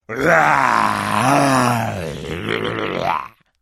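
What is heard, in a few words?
sounds of voice of caveman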